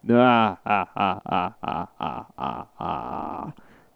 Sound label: evil laugh